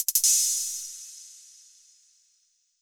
hihat low

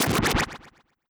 haste magic

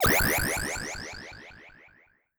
synthesizer

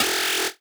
laser gun

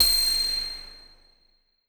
ice beam high short

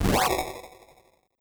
great sword slash lowbit long

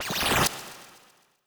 teleport slow appear

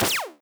standard shot middle

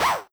dog bark synth

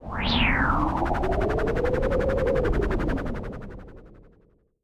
wind magic dust devil

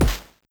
punch lowbit light

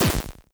enemy die high

